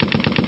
karabin

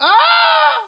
krzyk

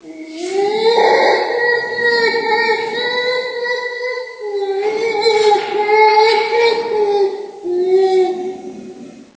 goryl

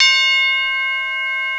dzwon

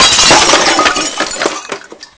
szklo

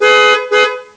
klakson

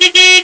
klakson

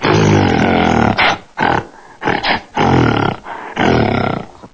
goryl

